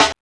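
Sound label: HSE SD, house snare drum; X-Static Goldmine 1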